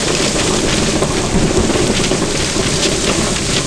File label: roll float, terrains; Tony Hawk's Project 8; XBOX